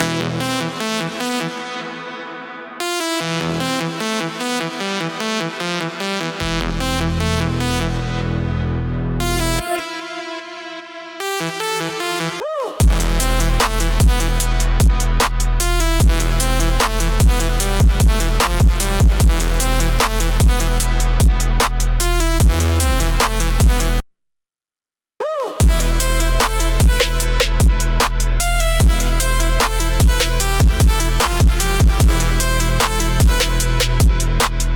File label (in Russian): gnev ispolina, trap